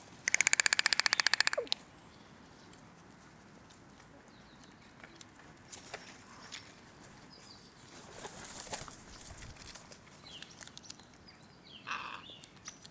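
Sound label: hooded crow corvus cornix cornix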